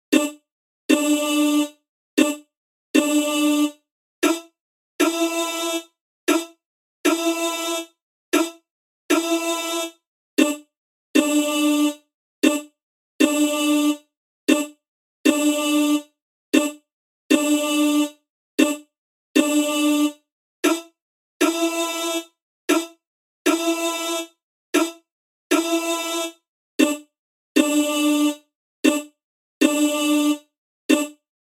Voice intro

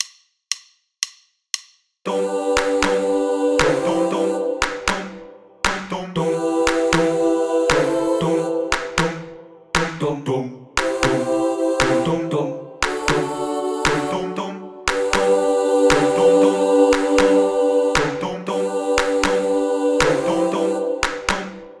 CHORUS clap

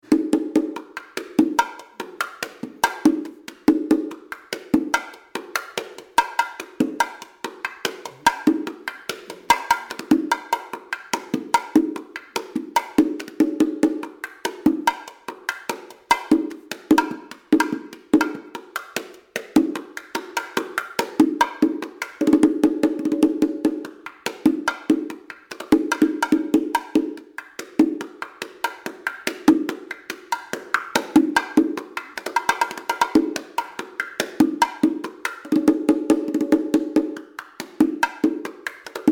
bongo intro

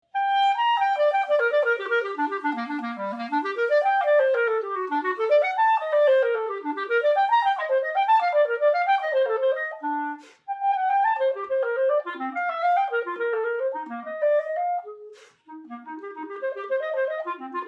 clarinet intro

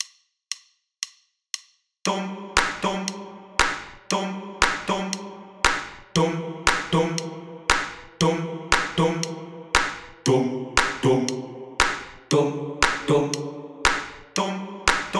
simple bass clap

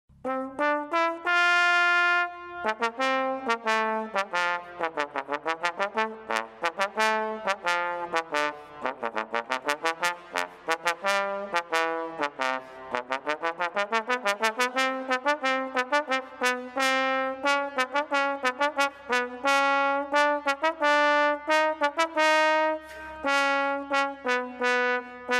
trombone intro

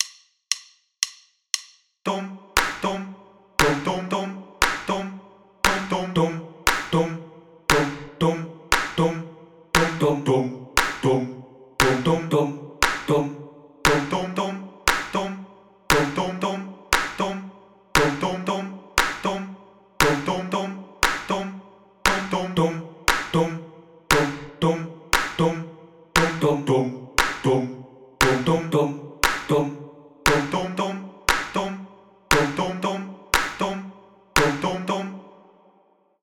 Bass clap

